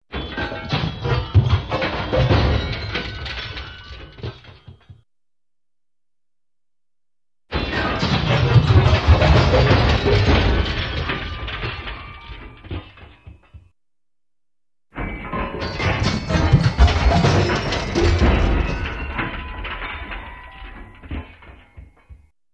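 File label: Metal Fall Down Stairs, crashes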